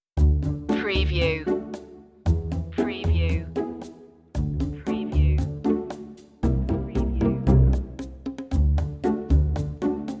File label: strings and perc only